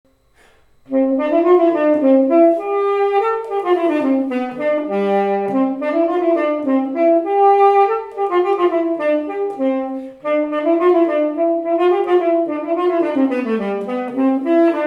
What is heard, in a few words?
Tenor Sax Dance; 8th grade all state